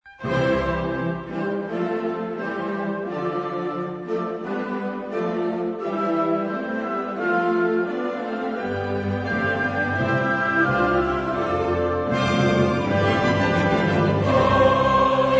Mass in C Major Gloria Quoniam tu solus Sanctus, chorus; Beethoven